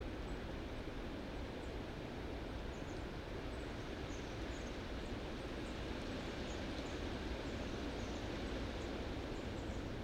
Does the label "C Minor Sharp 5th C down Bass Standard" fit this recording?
No